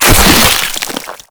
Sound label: Maggot Pain; Enemies; Vocals; Warframe